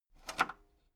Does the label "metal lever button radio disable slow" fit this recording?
Yes